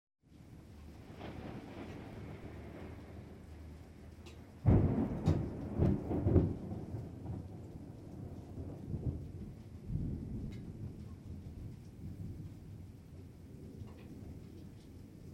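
thunder rolls and calm rain outside the house window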